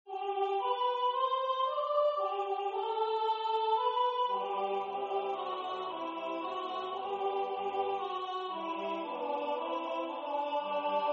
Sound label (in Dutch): Alt2; F.Mendelssohn; Weihnachten